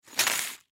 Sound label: Plastic Bag Scrape Cement Surface Small Metal Objects Nuts; Foley; Foley Plastic Bag Scrape Cement Surface Small Metal Objects Nuts Bolts Nails Screws Tools; Blastwave